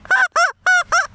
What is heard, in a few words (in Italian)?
campanello